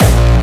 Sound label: Distortion Kicks, Bassdrums